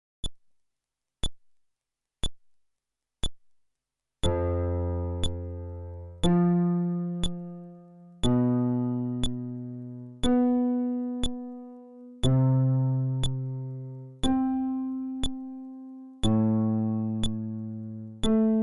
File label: Arpeggio, fretless bass